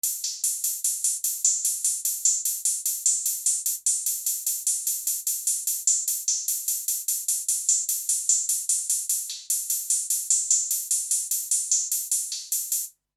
Kawai XD-5 Hi-Hat Single

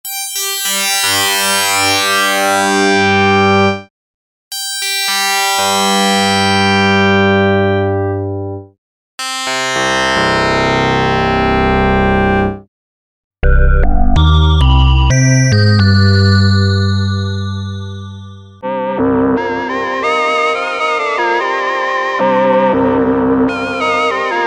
waveshape

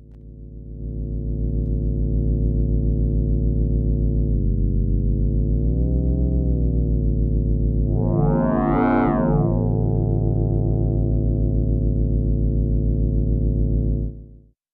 spectraphon tones; makenoise

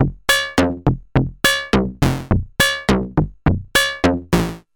Reon RE501-R Driftbox-R Dark Metallic Percussion

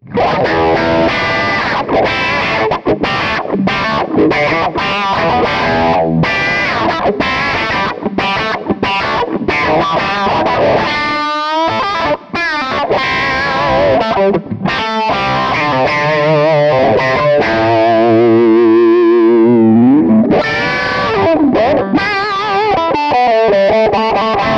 Kemper Profiler Player AC20 Higher Gain Touch Wah; Morgan

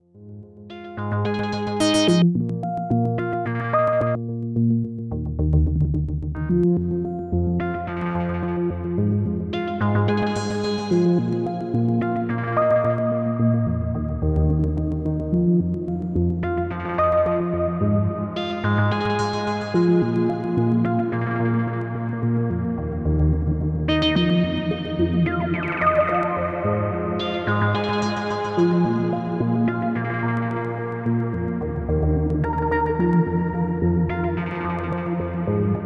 Synthesizer Reverb Plate Specular Tempus

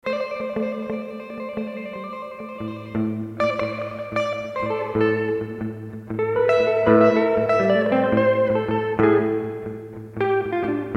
Matthews Effect Cosmonaut Reverb; Sputnik

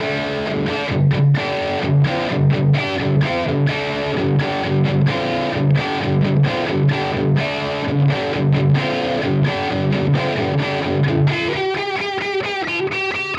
Strymon Iridium Punch

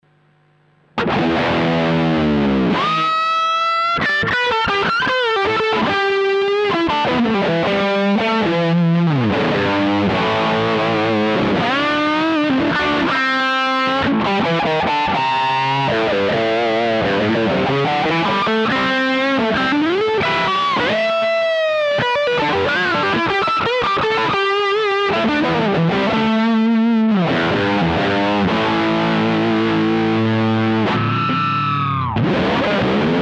Overdrive Channel plus Boost; OTS DI

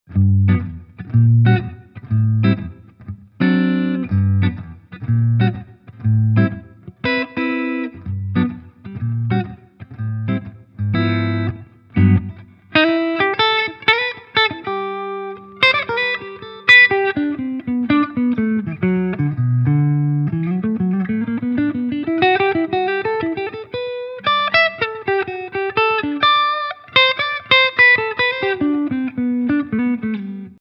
SC DLX Neck clean; Harley Benton

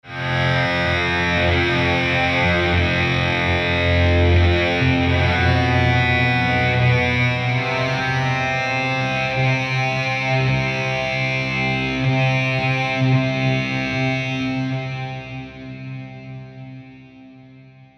Guitars Long Distortion Sustains; Scoring Guitars 2; Xtra Playables

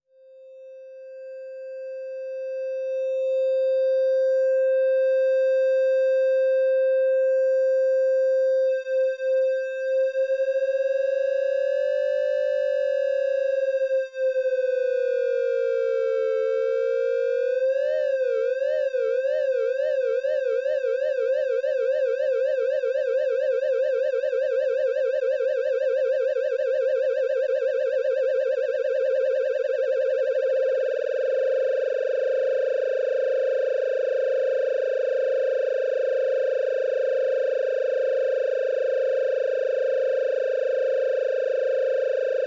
Vibrato

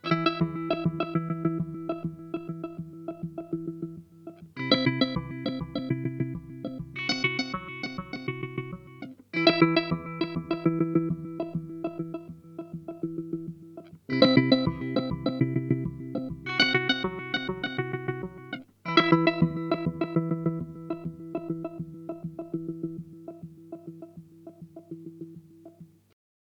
Boss SL 2 Harmonic

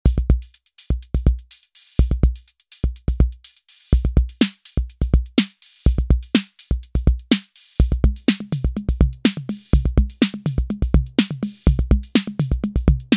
RD-6 Electro Beat

